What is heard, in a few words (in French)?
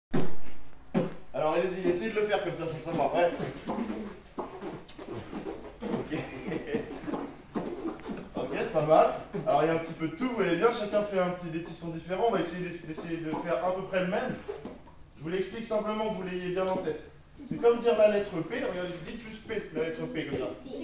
son beatbox